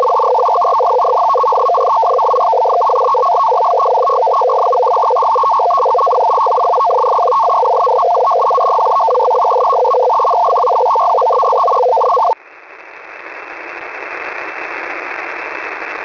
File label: usb; Mode: / USB / Frequency: / 17410 khz